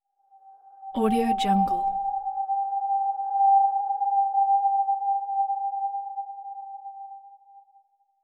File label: jungle bird calls